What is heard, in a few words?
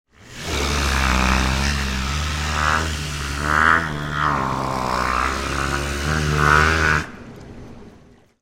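howler monkey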